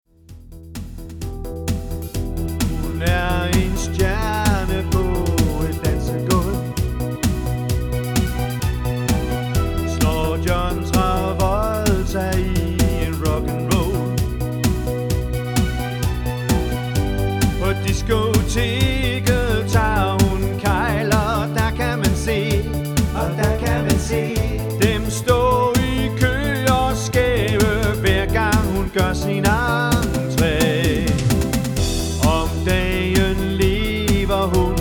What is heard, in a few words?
Disco Tango